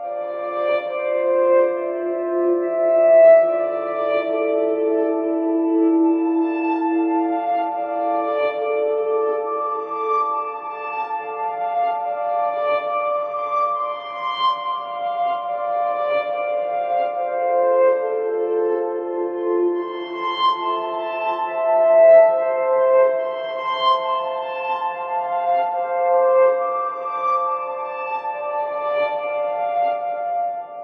Reverse Harp; ps vita; Didot Puzzle; Type Rider

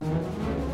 Music Segments Fight Cold Theme Easy 160bpm Start; asterix obelix slap them all 2

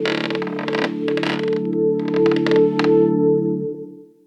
Amb; inbento

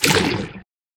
Gibbing; Cruelty Squad

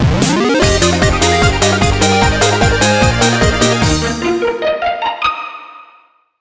Clear Bgm; Kotoba no Puzzle Mojipittan Encore; android

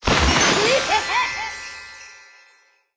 Clicker game smash snowman

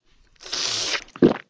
Food swallow

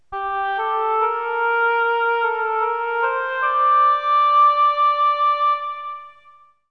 Signature Sting; Clue; Mrs. Peacock

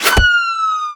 Boss Attack

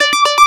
KEY Hold loop

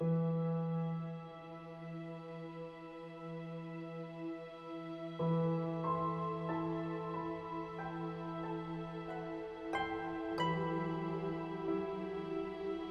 music cutscene loop; Aragami

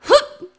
mom jump; Ringling Bros. and Barnum & Bailey; Wii

